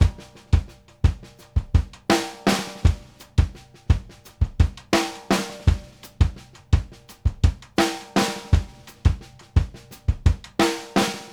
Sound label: 2ND LINE, ORLNS 84; Sampleheads; New York City Drumworks VOL-1; SP ORLNS 84